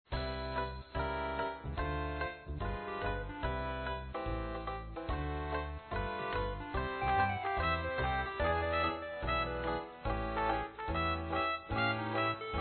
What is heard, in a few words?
Abandoned Sax